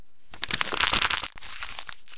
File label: zombie rotting; Hellboy